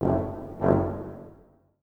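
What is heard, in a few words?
FULL ORCH, orchestra; East West; Symphonic Adventures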